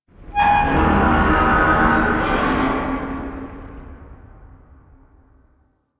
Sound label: Ambient Tank Vocal; Nineteen Ninety Nine